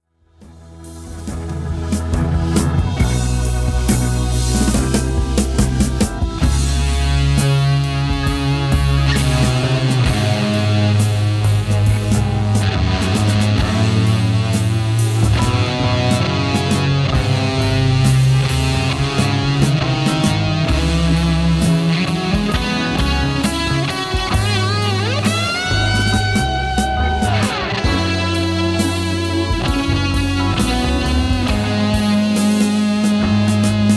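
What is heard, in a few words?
guitar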